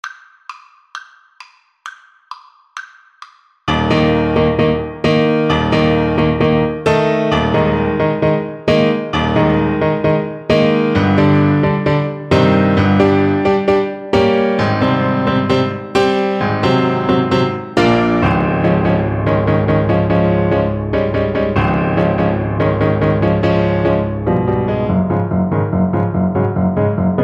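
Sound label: bartok romanian folkdance 6 alto saxophone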